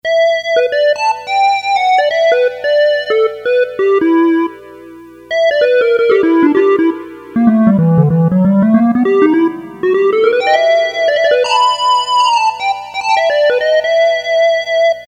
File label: Synth Reed, Casio CT-370; Tones